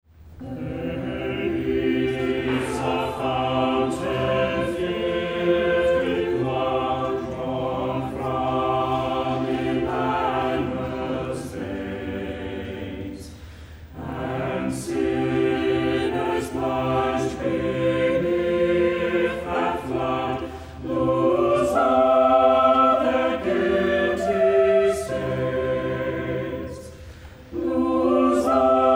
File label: gospel hymn; first scrutiny; There is a Fountain